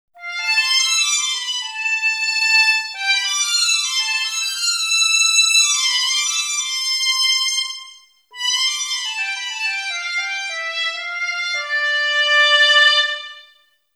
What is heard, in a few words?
flut; wallander instruments; wivi player